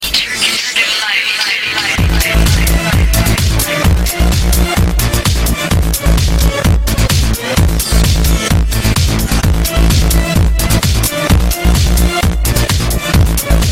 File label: Arab